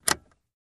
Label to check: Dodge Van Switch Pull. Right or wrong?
Right